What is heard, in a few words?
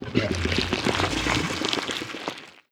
idle subtle, barnacle; Half Life Alyx